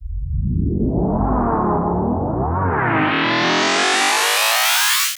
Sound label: reverse, FX; Vengeance Samples